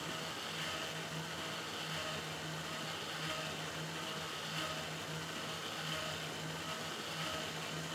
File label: robot walk loop; teardown; ps5 windows xbox series xs